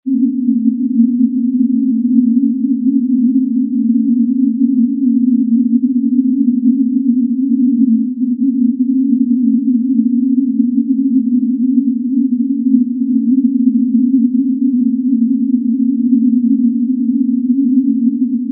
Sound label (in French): bruit rose